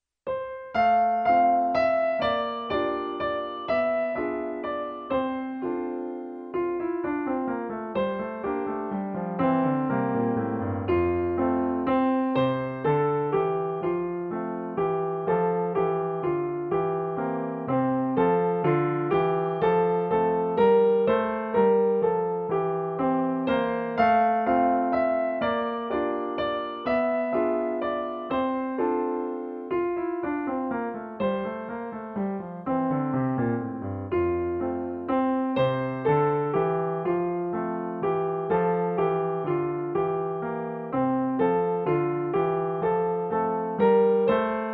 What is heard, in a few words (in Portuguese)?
PIANO; O macaco Simão